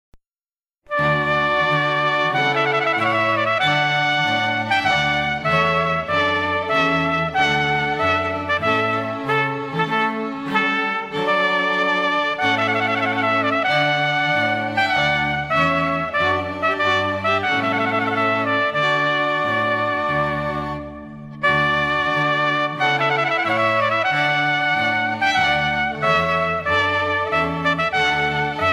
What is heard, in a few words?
Trumpet Voluntary Trumpet violin viola cello quartet